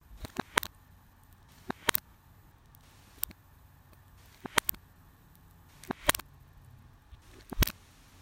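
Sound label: interesting and funny sounds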